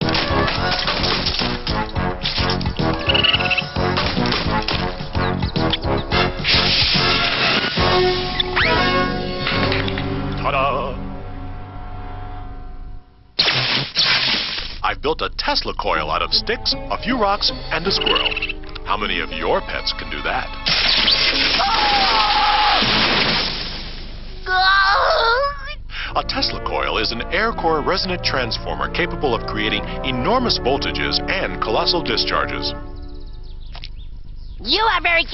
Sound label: tesla coil; TeslaCoils